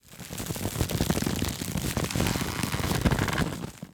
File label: string force